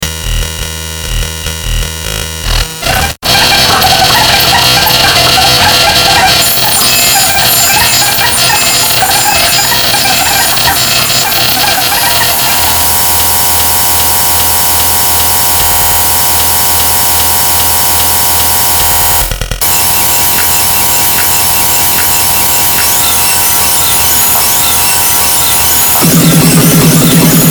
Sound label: minimalist extratone junglecore